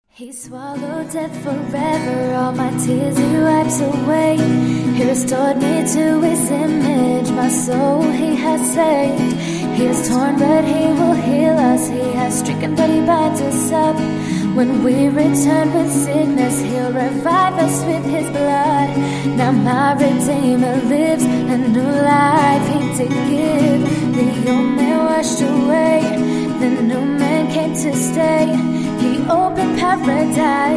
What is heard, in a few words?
Vocals